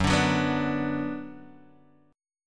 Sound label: Gb Suspended 4th Sharp 5th Gb, down; strummed; JGuitar